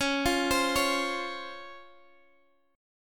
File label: C sharp Major 7th, Guitar, Open G